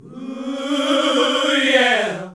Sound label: HAL GOSP. MIXED